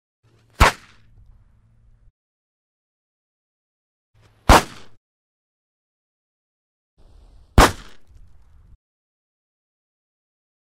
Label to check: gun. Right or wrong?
Right